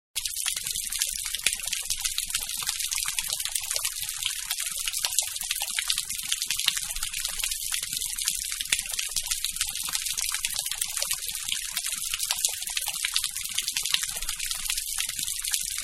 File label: Rain Heavy Falling Into Puddle; Rain (Sound Effects:Weather); Manhattan Production Music